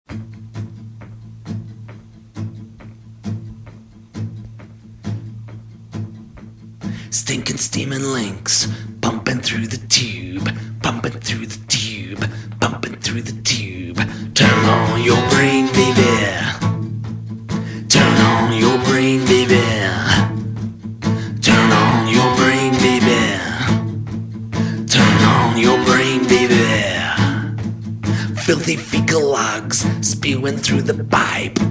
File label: acoustic version